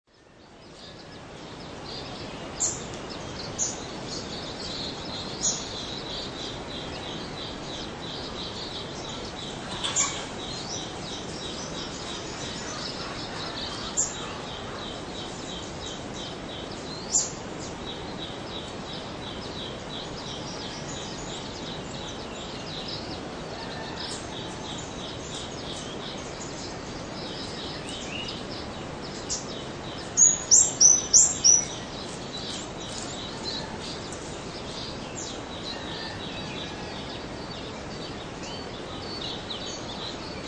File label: Outdoor Daytime Ambience, Far Eastern park area; Quiet surroundings, birds, light breeze. Occasional distant duck